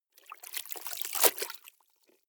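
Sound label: clothes water up down medium, household; haus-garten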